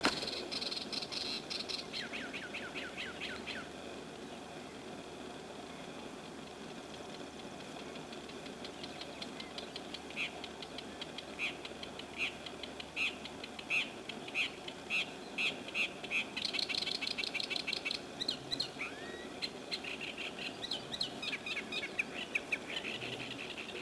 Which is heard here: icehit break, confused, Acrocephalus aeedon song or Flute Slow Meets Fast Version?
Acrocephalus aeedon song